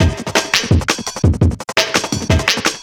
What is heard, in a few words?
Break, Drum N Bass Percussion